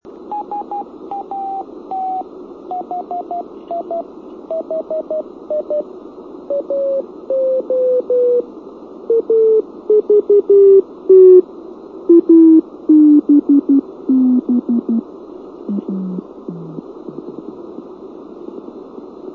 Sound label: CW DOPPLER